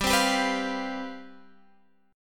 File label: C Major 7th Suspended 4th, G, guitar chord